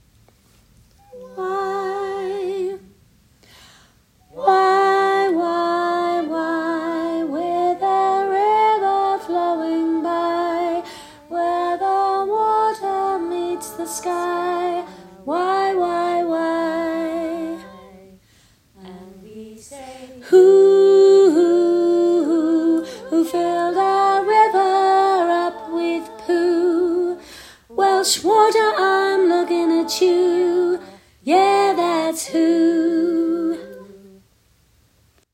soprano

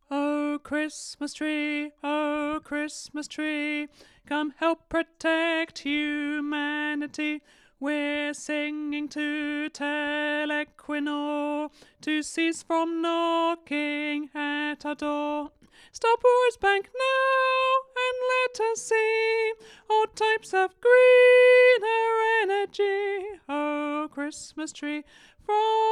Alto

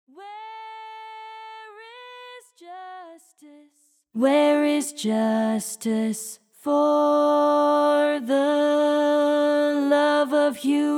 voice

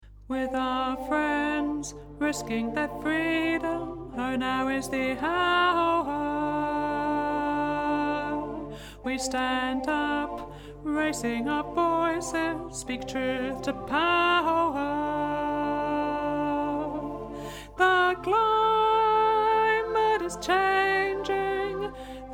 alto

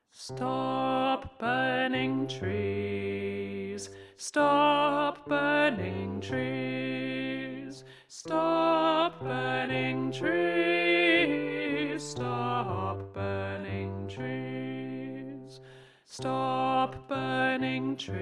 bass short

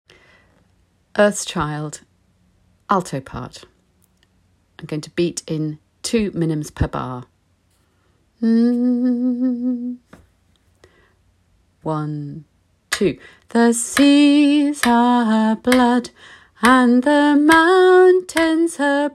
Alto

